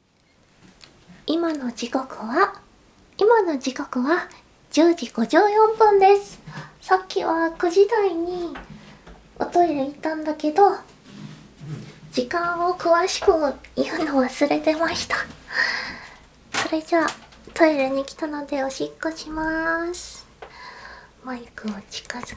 コーヒー飲んでからおしっこしちゃうの

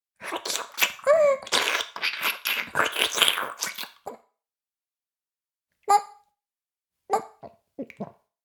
フェラ音声, ASMR; 妹

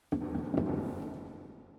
温泉(カコーン)

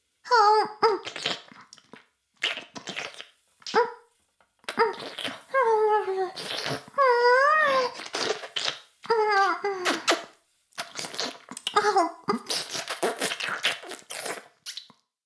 フェラ音声; 古き城のドラゴニート2

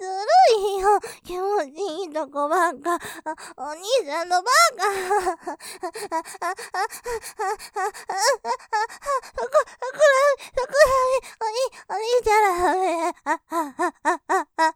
喘ぎ; 気持ちいいところばっかぁ；；ばかぁ；；; ループで楽しむ！シェフの気まぐれ抜き抜きコース; モノラル

